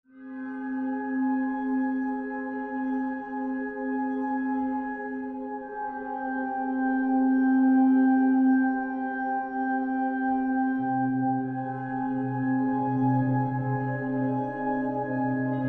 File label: Cry, Tension Slow